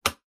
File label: Light switch being turned on and off with multiple clicks. Switch, Light Click, Light Switch